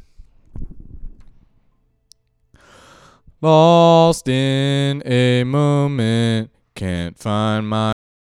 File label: Male Ambient Lead Voc